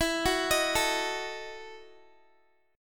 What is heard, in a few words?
E Major 7th Suspended 2nd Suspended 4th, Guitar; EM7sus2sus4 arpeggiated